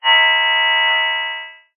science fiction radio receiver transmission interference burst whine; zapsplat